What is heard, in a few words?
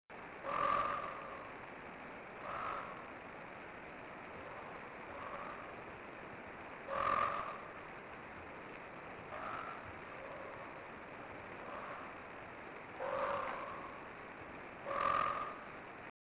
eleutherodactylus inoptatus, bahoruco national park dominican republic; low-pitched and grating croaks; mesic forests; West Indian